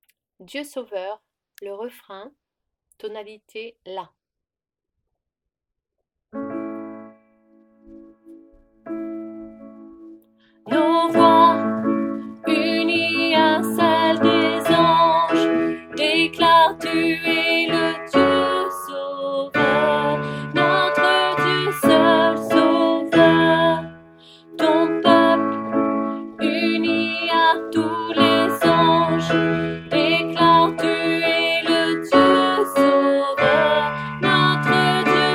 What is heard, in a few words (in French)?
Dieu sauveur refrain voix alto